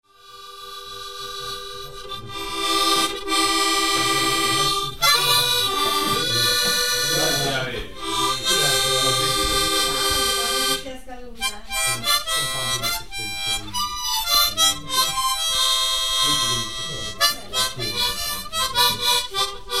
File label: song; mouthorgan